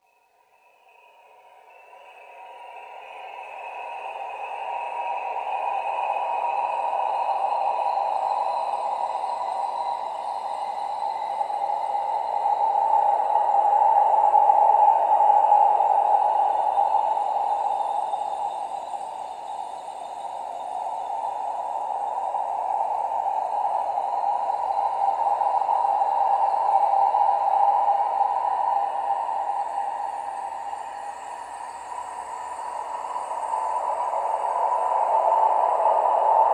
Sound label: Well Grain Texture